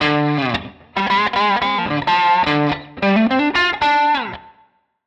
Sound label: Guitar Line, hiphop; musicradar